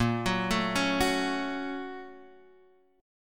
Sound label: A sharp Minor Major 7th Sharp 5th, down, Guitar, Half Step Down